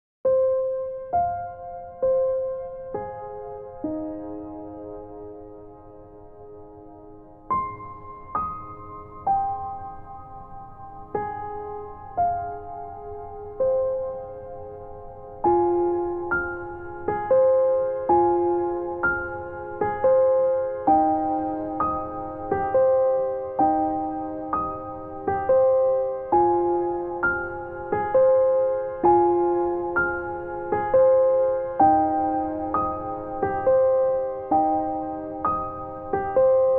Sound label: song calm nature; dolphin tunes